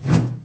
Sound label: throwable woosh low; midnight guns